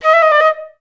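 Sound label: flutes D#4; Best Service; Peter Siedlaczek's Advanced Orchestra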